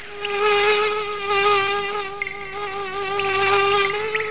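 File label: mosquito buzzing; kunchong jiaosheng; wenzi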